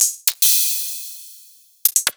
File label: Hi Hats